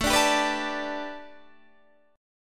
A 7th Suspended 2nd Suspended 4th Guitar Open D

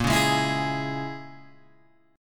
A sharp Minor 7th Flat 5th, down, Guitar, Open G

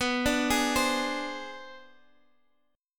B Minor 6th Flat 9th B

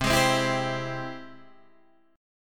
Ab Augmented Major 7th C, guitar

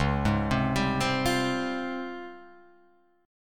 Db Major Sharp 11th, Guitar Open F; arpeggiated

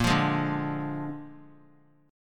Bb 7th Bb down 3C,3F,3A,3D; strummed; JGuitar

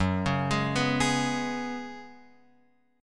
Gb Suspended 2nd Suspended 4th Gb Guitar Open F; Gbsus2sus4 arpeggiated; x,1,1,3,2,3

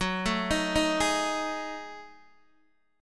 Gb Minor 6th Add 9th Gb down Guitar Open D

